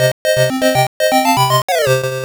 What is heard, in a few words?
Game Defeat; Unten Thomas Deluxe Set; pico